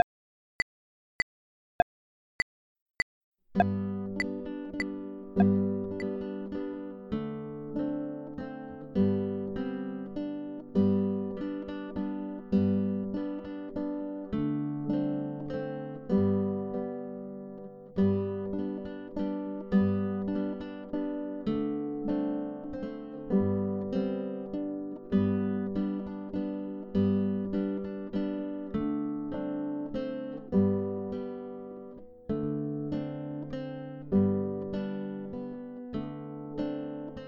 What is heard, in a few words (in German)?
alter tanz playback